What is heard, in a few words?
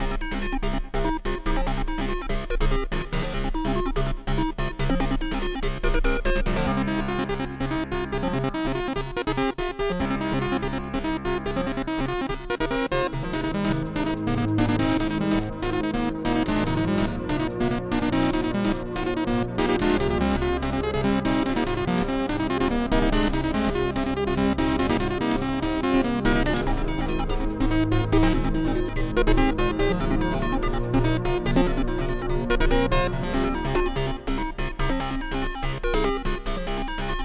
nightmare chip heavy